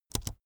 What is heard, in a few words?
keyboard laptop space press fast soft